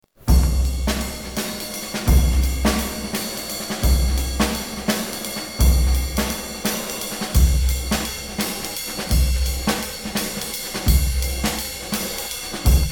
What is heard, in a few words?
drums pattern